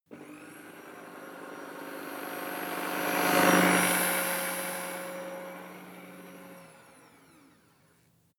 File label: fly by humming drone passing